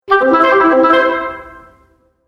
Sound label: Flute Transition; Transition Sound Effects